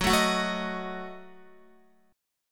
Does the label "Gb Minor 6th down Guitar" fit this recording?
Yes